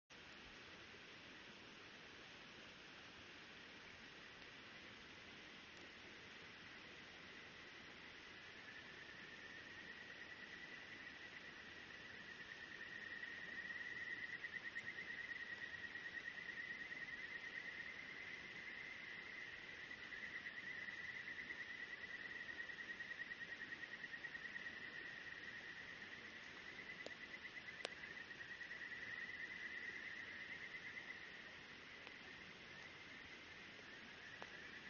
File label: riverside